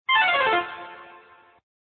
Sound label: Snake Cobra